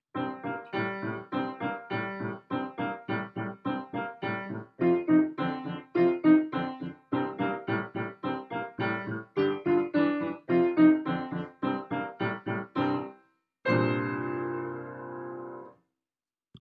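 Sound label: Flint Street Boogie, Piano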